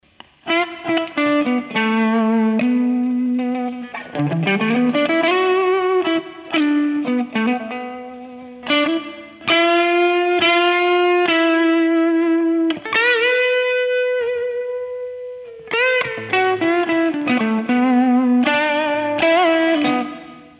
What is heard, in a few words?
crunch lead